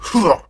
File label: jump, crusader; scourge